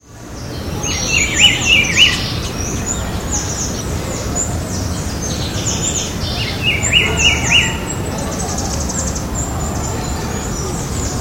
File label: Cyclarhis gujanensis; Int Butantan; SP